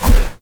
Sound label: Heavy Melee Swipe, Weapons